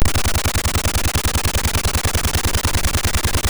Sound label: sid MOS6581R2; Fiendish Freddys Big Top O Fun